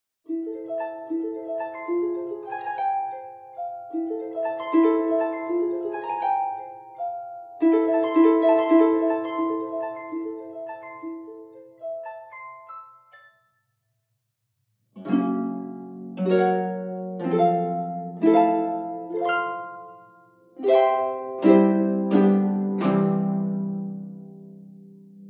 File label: harp example Noise reduced; VI-Pro